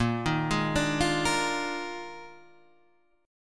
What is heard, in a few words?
D sharp Major 7th Suspended 2nd Suspended 4th, A sharp, guitar chord